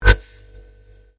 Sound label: Convolution Surge; Sound elements to create more complex sound effects; Swell Sound Effects; Swells (Sound Effects:Sound Design)